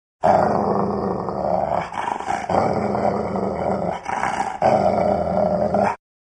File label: Dog Growls Viciously